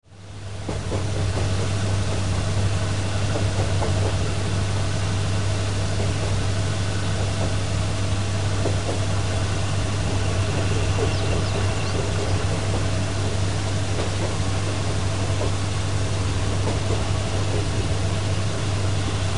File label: kansaisen kiha20